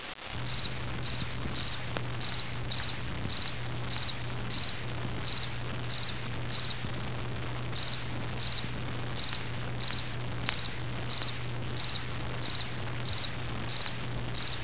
Krekel; Dieren geluiden

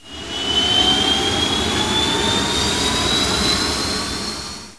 Vlietuig; vervoergeluiden

